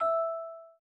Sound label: bell; fallguys